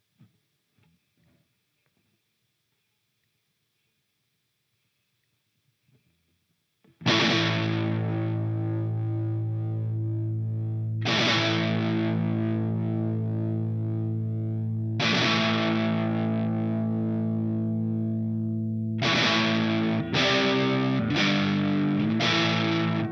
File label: Orange Thunderverb 200; ik multimedia amplitube 4